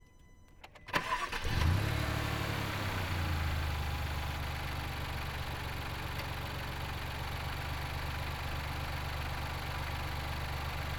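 Alfa Romeo, Giulia, Onboard, Start, Idle, Steady, Blips, Off, Engine; Quadrifoglio 2015; Pole Position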